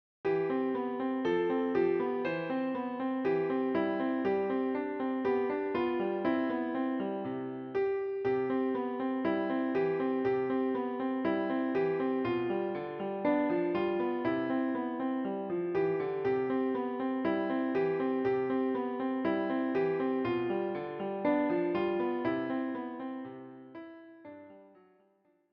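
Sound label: piano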